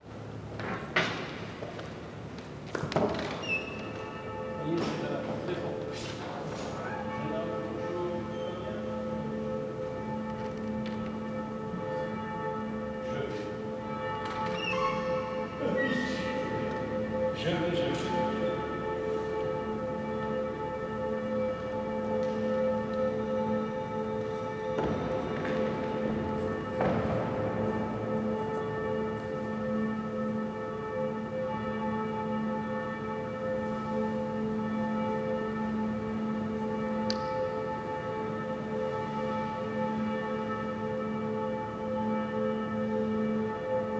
cathedrale

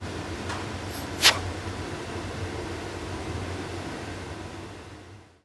souffler du verrre

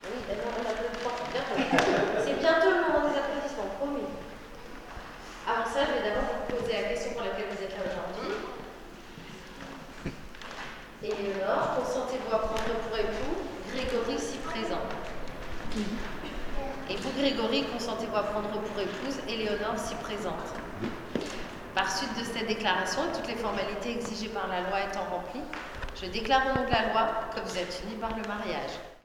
mariage mairie

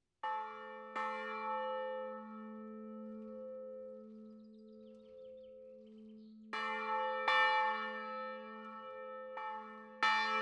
cloche ronchamp

